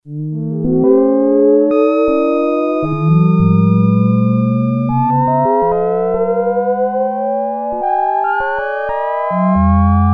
Video Games, Prophet-5; Sequential Circuits Prophet-5 (Ver.3); Factory Patch; File 3 Programs

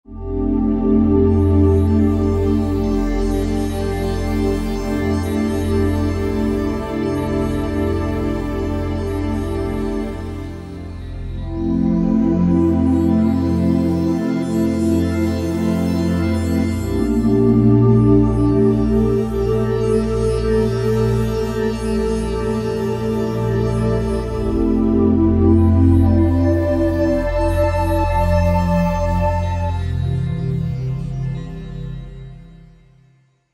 Korg Wavestation A-D

